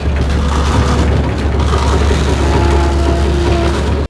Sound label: Elevator Damaged